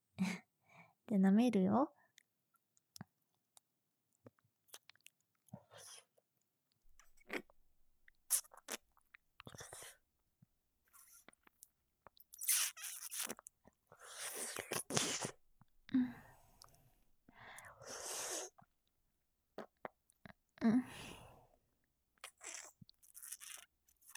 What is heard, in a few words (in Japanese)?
フェラチオASMR